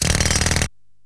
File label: jackhammer; wob2 demo